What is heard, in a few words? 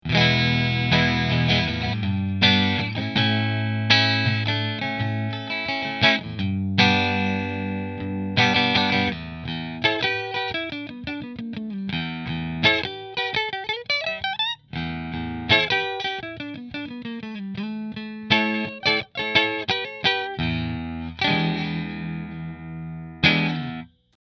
Rahan Double Maple Position 1 Fender, guitars